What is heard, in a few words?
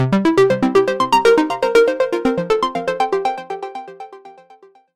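Samsung Galaxy S22 ringtone notification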